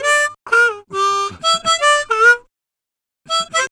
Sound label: Harm 129bpm G, Harmonica; Quazibeat - African Secret